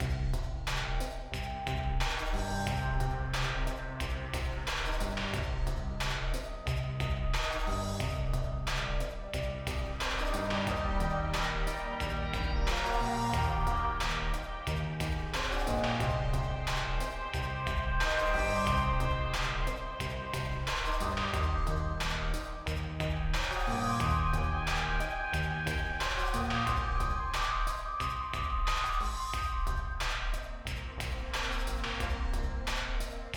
Tavern Blues; Griftlands

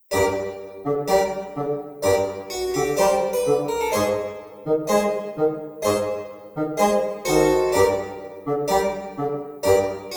Soft; Lady Unterwald; Loco Motive

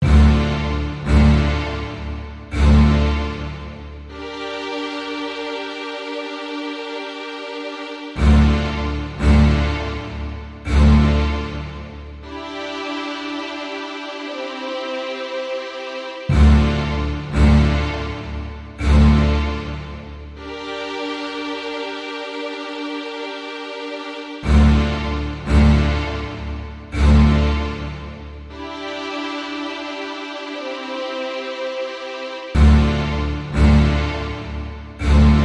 bgm ambient; story

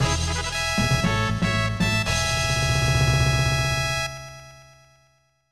Fanfare; Leading Jockey

